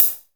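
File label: hi-hat, drums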